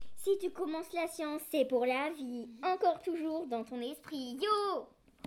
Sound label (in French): jingle sciences